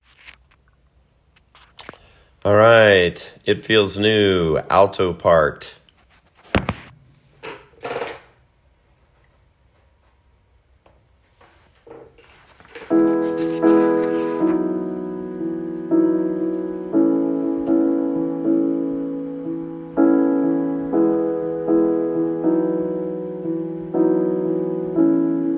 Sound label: Alto, It Feels New ALTO; RCCC Una Voce & Cantiamo